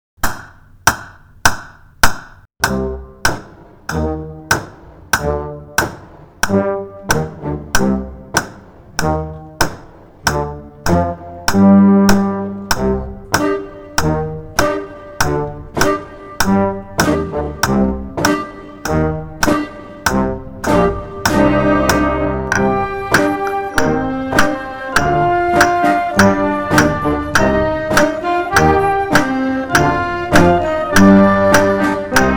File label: Creatures in the Attic (Practice Fast Clicks)